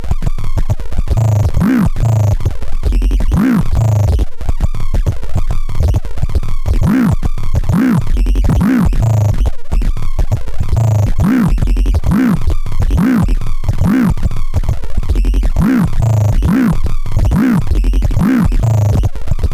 cyclebox tri sine feeding pitch of 2nd cycbox table vowels